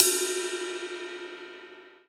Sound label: Jzz Rid, drum machines